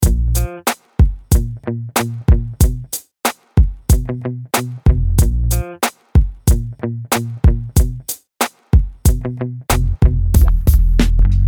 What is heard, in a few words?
Funk Lord Beat